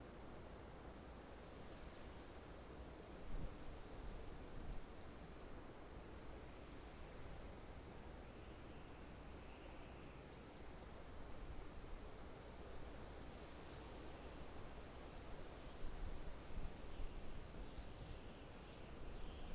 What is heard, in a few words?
Harbor Village Bridge